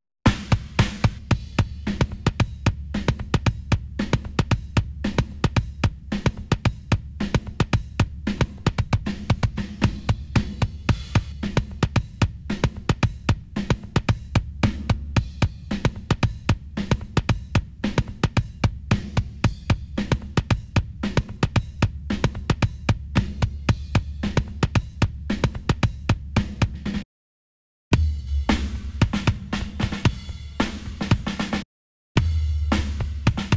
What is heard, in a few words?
drums